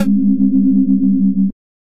String Pluck, analog; Guitar & Plucked; Ableton; Factory Packs; Synth Essentials

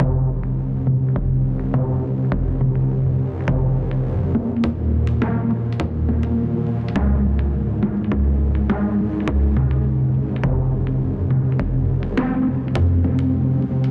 Plodding Bass - Cmin 69 bpm; MIDI Clips; Tonal; Motifs; Ableton; Mood Reel

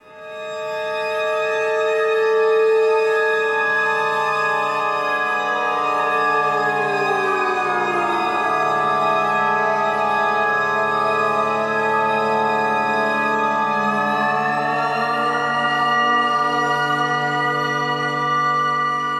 Creepy Cello - Dmin 100 bpm, Atmospheric; MIDI Clips; Tonal; Ableton; Factory Packs; Mood Reel

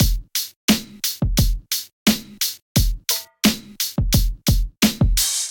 MPE Kit, Golden Era Hip-Hop Drums; MPE Kits; MPE Pain Killer Kit; Ableton; Factory Packs; Golden Era Hip-Hop Drums by Sound Oracle

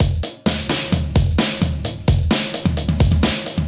DnB Beat 164bpm, MIDI Drum; Ableton; Factory Packs

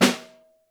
SN1 Room, Snare

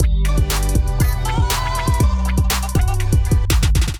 Kit, Drums; Azimuth Kit; Ableton; Beat Tools

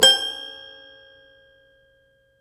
hard C5 127; Ableton Foundation FreePacks; Foundation Glitch Toys & LoFi

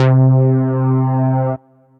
Brass, synth brass; Prophessor Cinco Brass; Ableton; Factory Packs; Singularities

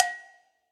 Cowbell Mid Reso Classic; Drum Hits; Ableton; Factory Packs; Session Drums Club; Standard Hits

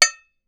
Pot metal, household; Convolution; NI Kontakt 5

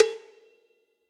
Cowbell Big Reso Classic; Drum Hits; Ableton; Factory Packs; Session Drums Club; Standard Hits

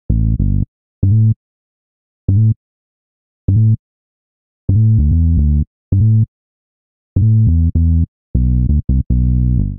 A Minor 85bpm, Bassline; MIDI Clips; Beat That; Ableton; Factory Packs; Chop and Swing

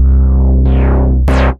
Bounce, Wavetable, Bass; Instruments; Bethnal Bounce; Ableton; Synth Essentials

